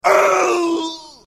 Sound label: male shout